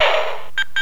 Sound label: hit; STRIKER